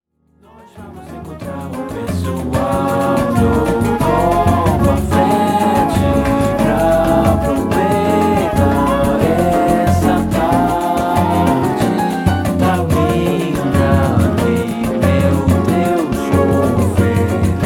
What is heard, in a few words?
batucada jazz